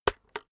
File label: keyboard external enter hit slow